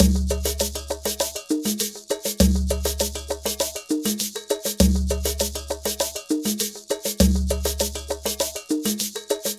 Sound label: Ensemble, Latin Percussion